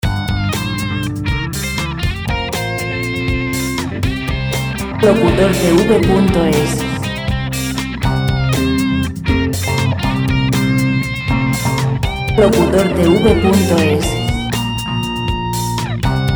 120 rock; música sin copyright; Música de rock sin copyright

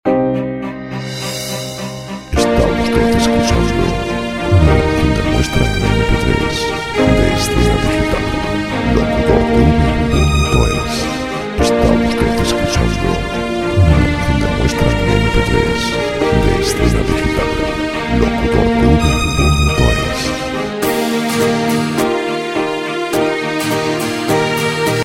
104 pop; Música pop

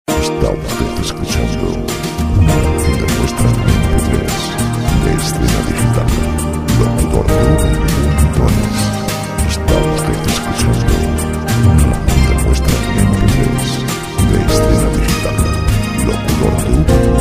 100 pop; Música pop

